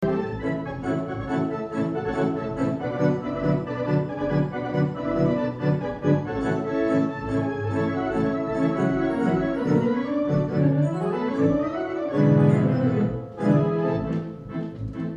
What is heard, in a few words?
glock